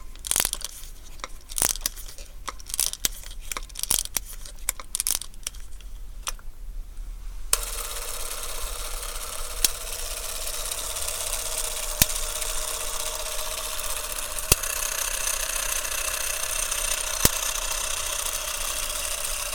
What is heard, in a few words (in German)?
Schmalfilmkamera AK 8